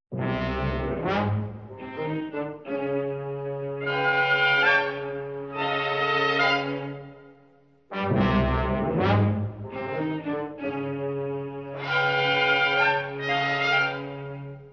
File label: Comedy Suspense